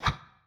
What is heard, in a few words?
Fight Wing Kick; MiSide